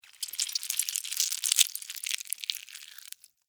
Larva Maggot Move, Larva, Maggot, Movement, Slimy, Insect, Bug; Larvae; Animals:Insects; Blastwave